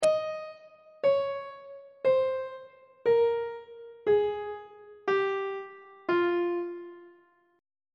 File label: f minor scale descending; on treble clef